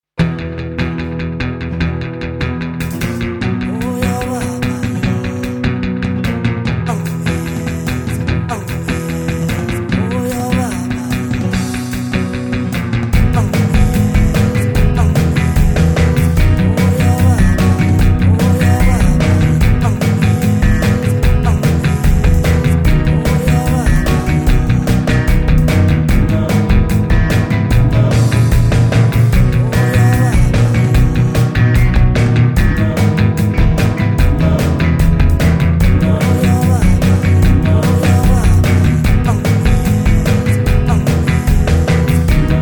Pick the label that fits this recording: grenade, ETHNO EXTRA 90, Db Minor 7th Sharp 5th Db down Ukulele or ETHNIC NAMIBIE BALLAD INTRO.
ETHNIC NAMIBIE BALLAD INTRO